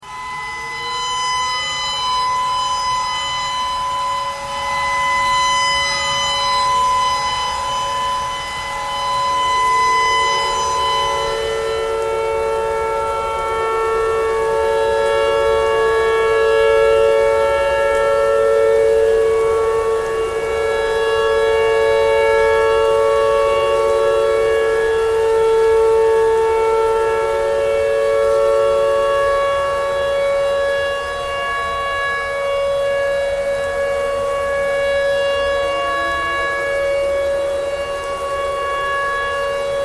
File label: tornado siren tornado siren thread noise